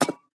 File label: Peg Leg Footstep